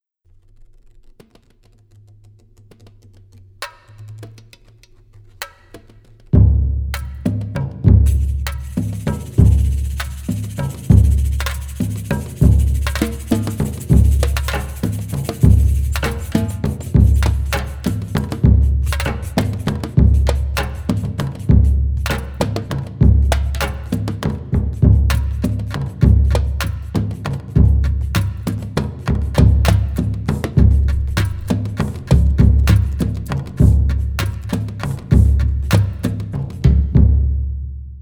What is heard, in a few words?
surdo solo